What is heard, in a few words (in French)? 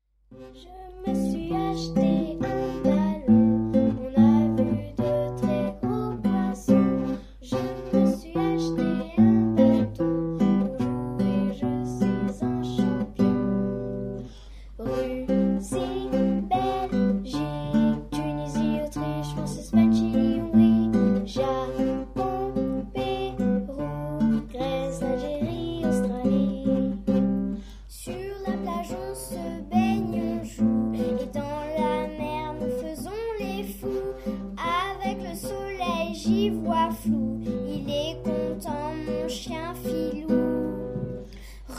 Chanson; Montbellet